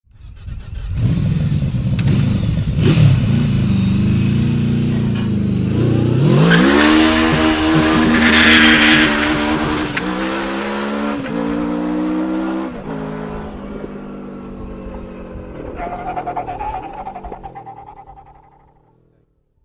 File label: burnout, Vmax; 新型VMAX; 排気音; バーンナウト